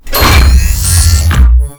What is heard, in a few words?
gauss siege start; doom gauss